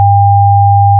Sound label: complex wave